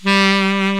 SAX COMBO

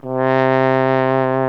TENORHORN C1; Best Service; Alpine Volksmusik

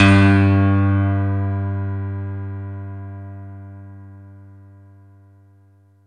JV E GRAND, JV-1080, PIANO; Syntec; Wall of Sounds

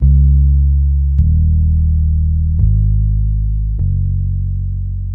Hip Hop and R&B Drumloops

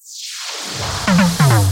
Fills, Loops 140 BPM

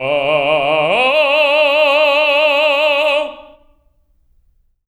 TEN D3, TENOR; TENOR OCT

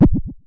Synare Filter; Star Instruments Synare 3

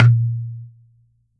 coconut; Q Up Arts; Denny Jaeger Private Collection

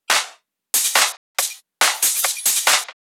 drum Loop 140BPM Perc

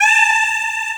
DIDI, SYNTHESIZER, DIGI WAVE

